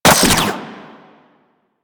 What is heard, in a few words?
Seer Fire Mech, Weapons; Warframe